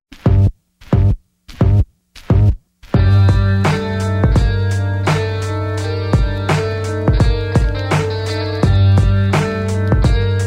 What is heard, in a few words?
funky